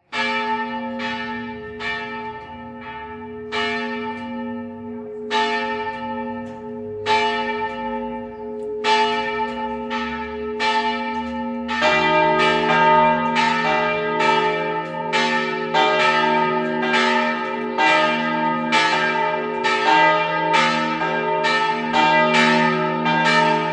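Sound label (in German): Glocken Stiftskirche Pöllau historische Glocken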